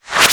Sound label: PERC; ZERO-G; Datafile